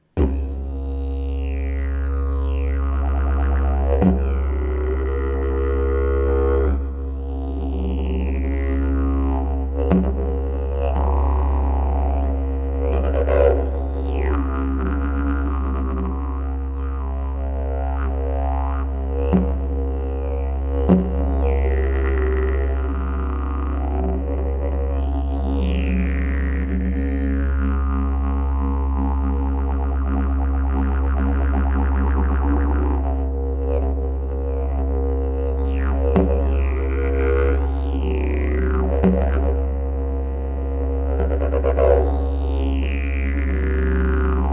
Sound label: didge